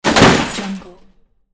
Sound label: large object falling on car; javierpaniagua